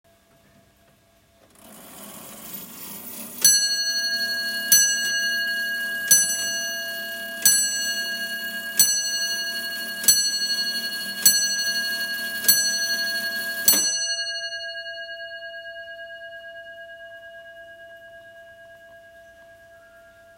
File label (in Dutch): Fries Modern Eiken